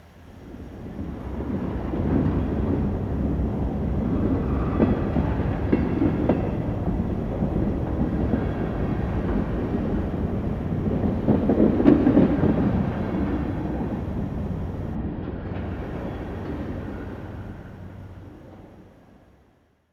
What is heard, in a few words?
train distant pass; ambient